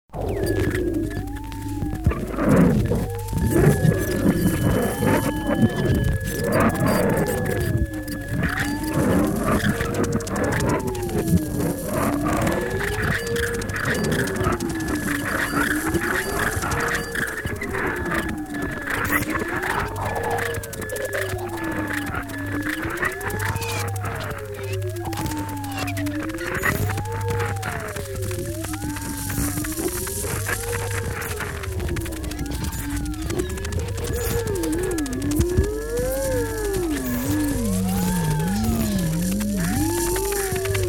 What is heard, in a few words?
Cicada Material